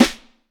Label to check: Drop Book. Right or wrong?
Wrong